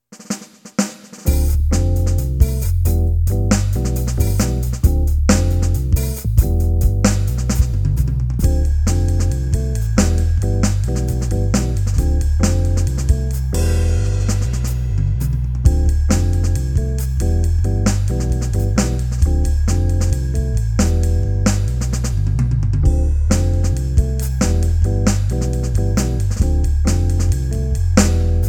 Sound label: temple jazz alternate version